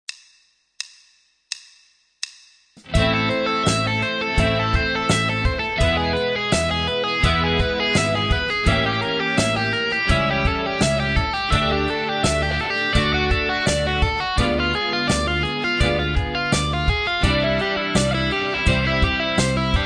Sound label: Rock & Blues Solos; De Haske